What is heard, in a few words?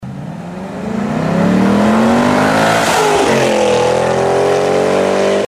corsa drive by